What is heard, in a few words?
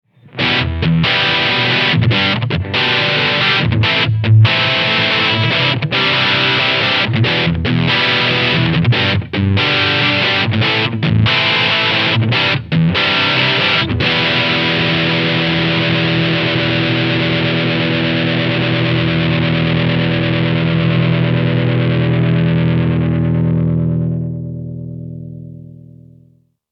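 demonizer low power crunch; Damage Control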